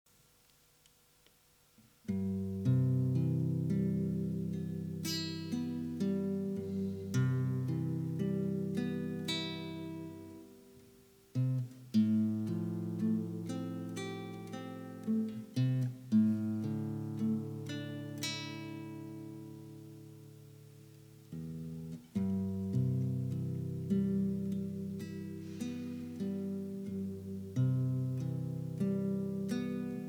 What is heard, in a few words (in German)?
Musik